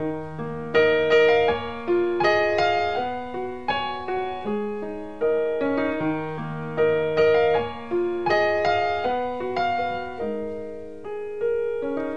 piano, sad loop

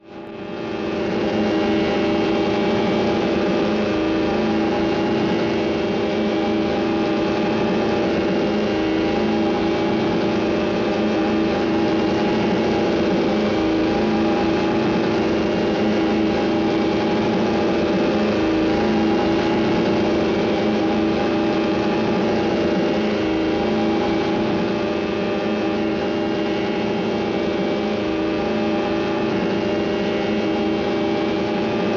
airplane, Vehicles

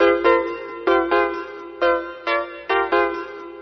keys, Phonky

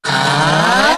"ah", group of men; Voice; ooh ahh

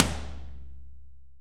Toms

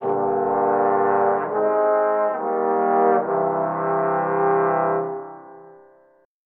horns sad for sorrow; Loops

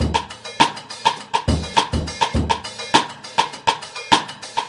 seven iron, Drum loops Boombap

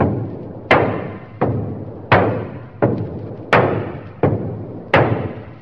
knockers, drum loops

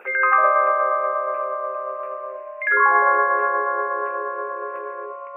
keys

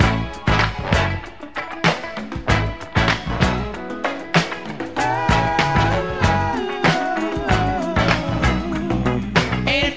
full, Loops Phonky

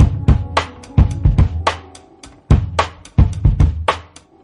whirlwind strings, Boombap Drum loops

